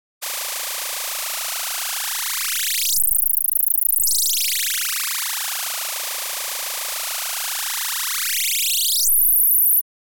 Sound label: Oscillating Multipi, synth tones